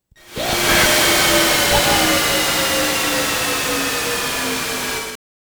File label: The Machine Explodes; 16bit; genesis gamerip 1993; Dr Robotnik's Mean Bean Machine; The 16bit Audiophile Project